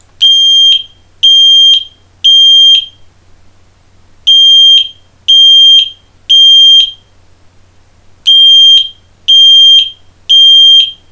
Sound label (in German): fireangel st 630 rauchmelder alarm